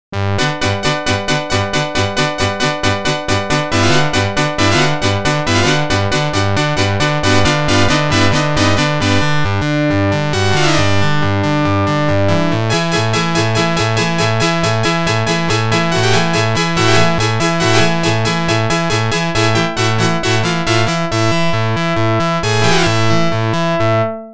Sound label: Organ; NHL 94